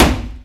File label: wood crate impact hard; Source Engine; video game; physics